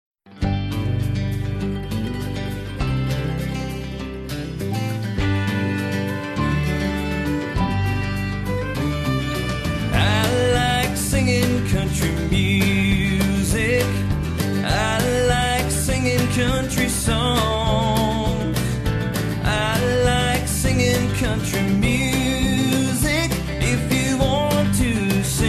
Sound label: Singing Country Music